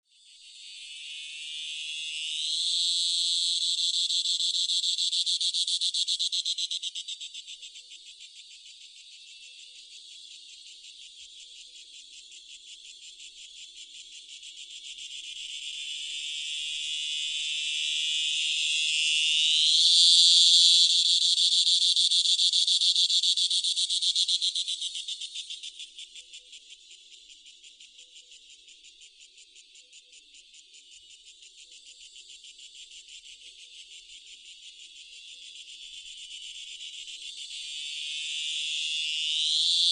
Kalabita operculata

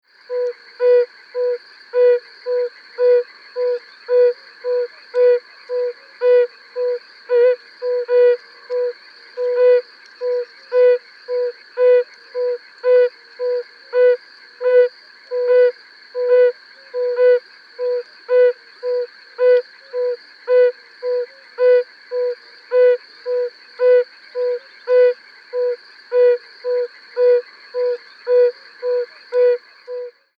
Bombina variegata

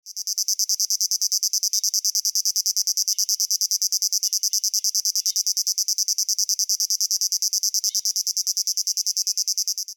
Ayesha serva

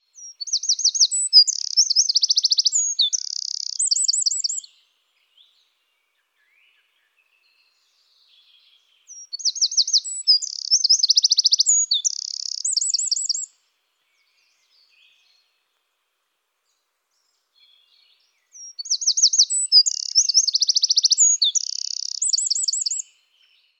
Troglodytes troglodytes

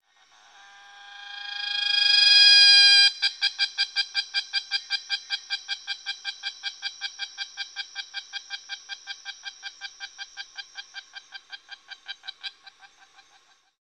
Megapomponia imperatoria

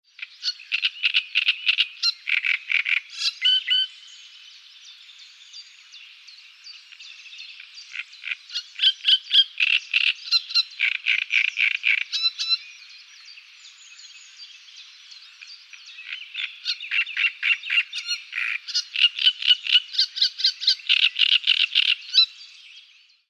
Acrocephalus arundinaceus

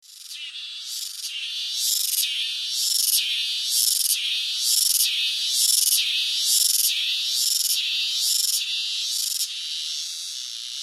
Cryptotympana aquila

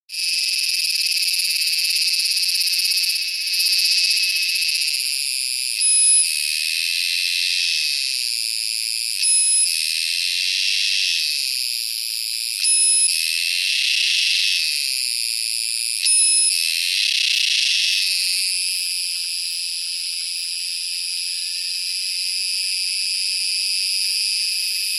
Purana sagittata

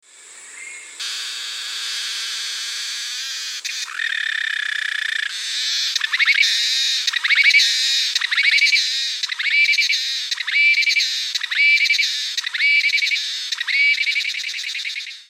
Trill cicada

